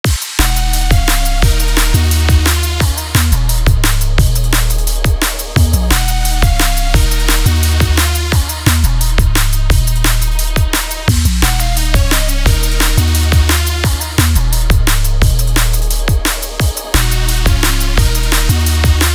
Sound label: Electronic Clutch Kick; Electronic Vol4 Music Pack